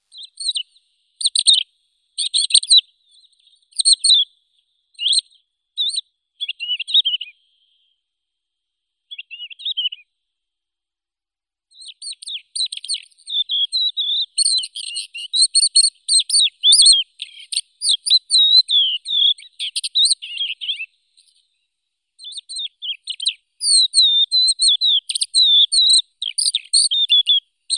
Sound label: zhavoronok hohlatii galerida cristata